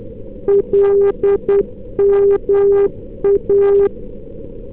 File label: NDB 311k